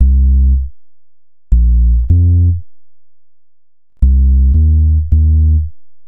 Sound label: Bass, Futurist Drum & Bass